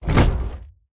put on table large

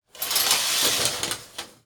pull curtain

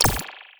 shielder

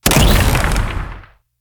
dark fire

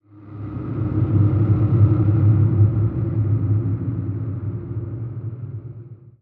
air buzz

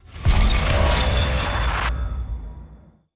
chain tight

